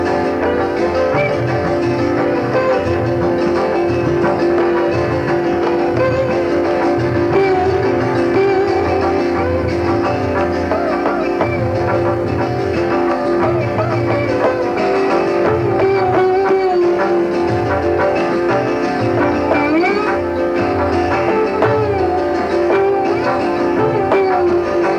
Punjabi Dhun, folk music

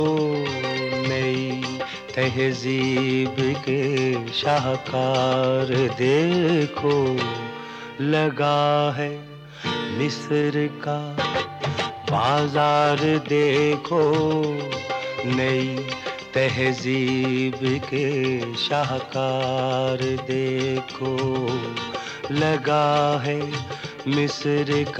Instrumental

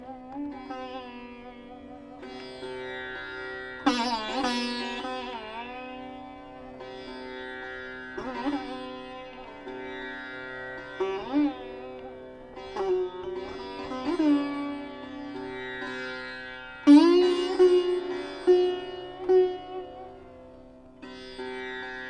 Raag Sham Kalyan - Sitar

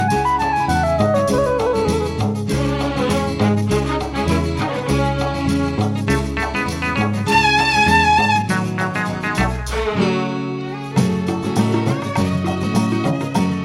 Instrumental

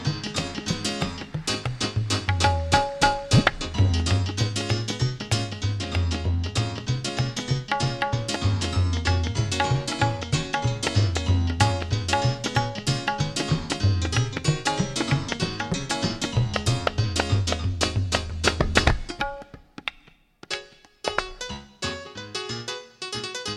Jazz Piece

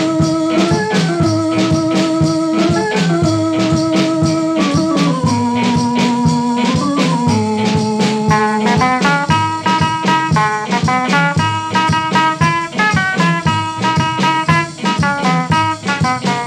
Pushto, folk instrumental

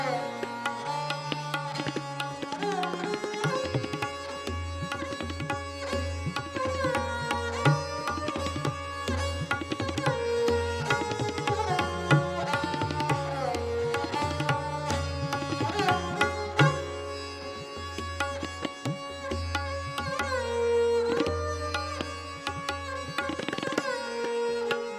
Teen Taal - Tabla

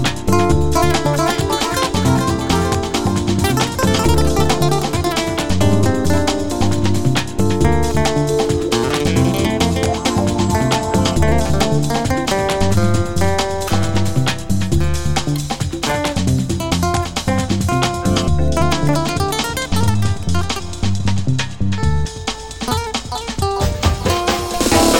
The Jazz Bit

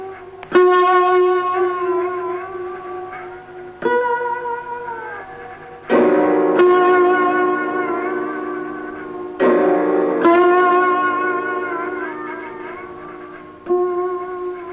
Punjabi Folk

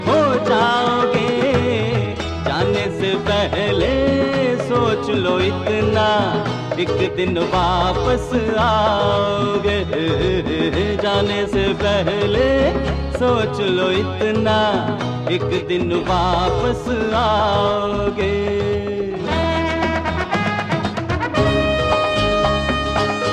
male; aandhi aur toofan; insaaf ka tarazoo

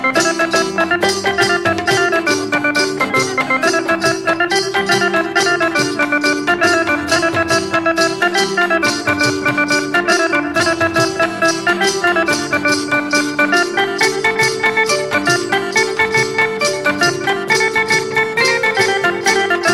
Pushto Folk

